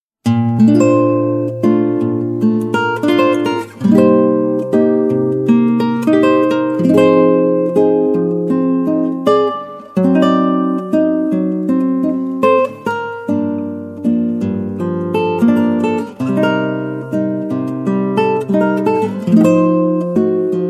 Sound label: Jazz Ballad